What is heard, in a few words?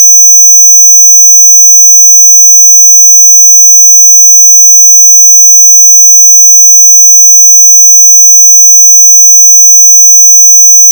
square 8k; 48k; square 6k